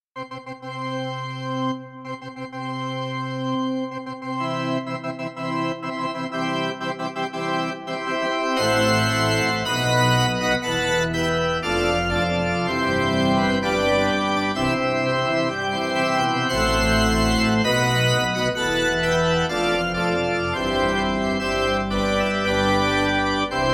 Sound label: Wedding March, piano